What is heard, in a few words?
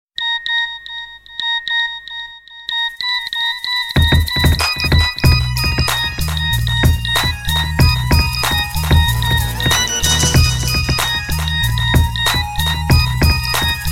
Nokia New Age